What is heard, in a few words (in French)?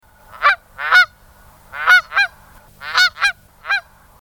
bernache